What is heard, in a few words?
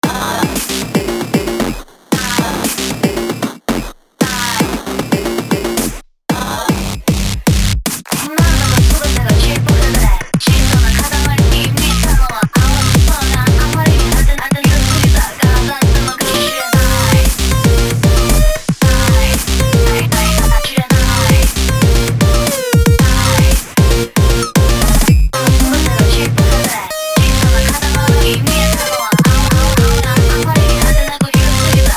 Polyphonic; Newgrounds